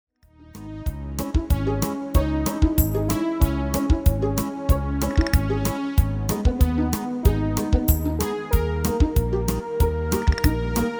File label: MIDI